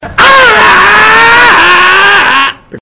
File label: ahhhh